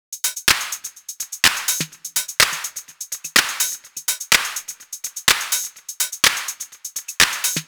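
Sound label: 125, DRUM LOOPS, TOP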